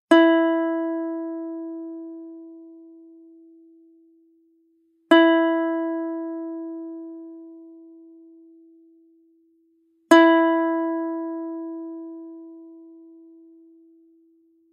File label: ukulele e string sound example